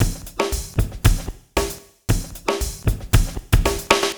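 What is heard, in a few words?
Fonkee Drums 115, Lounge Drumz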